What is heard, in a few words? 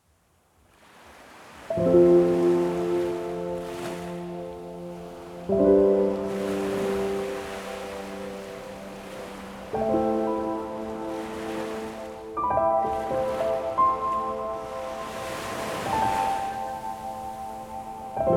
piano, sleeping ocean waves